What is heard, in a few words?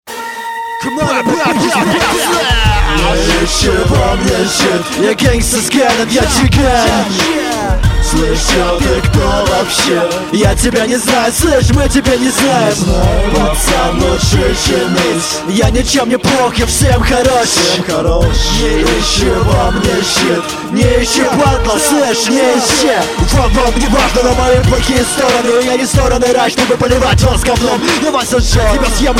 drum